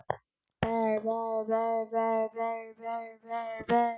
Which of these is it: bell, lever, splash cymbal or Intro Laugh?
bell